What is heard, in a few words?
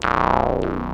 T-WAVE, Synth Waves